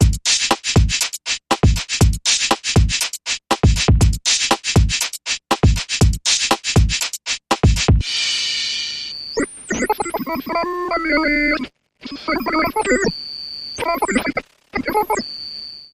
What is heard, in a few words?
Transmission Electronic Bumper Reversed